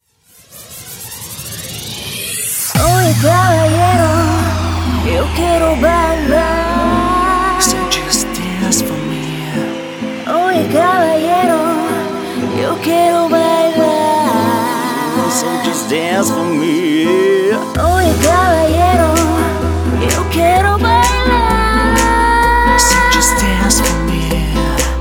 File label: salsa latino; radio edit; pop